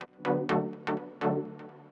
synth house 125 A; eJay; Keys

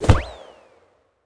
shoot Ball Med; Orbz

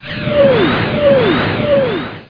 warp

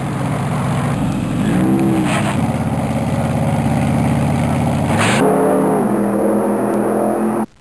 Drag Race Start; Soundsation; Vehicles

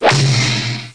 METAL HIT; 5THFLEET

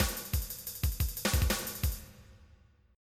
afro cuba

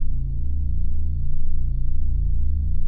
mall loop; jenquai; Earth and Beyond; EA Games

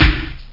snare

